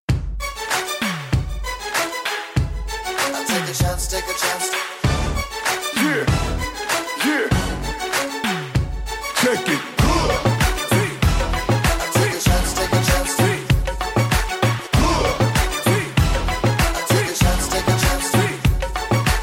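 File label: Windows 8 theme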